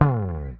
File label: SLIDE, Bass; Sampleheads; Will Lee Bass Library